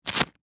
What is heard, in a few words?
Masking Tape Peel, Industry, Masking, Tape, Peel, Off; Offices; BlastWave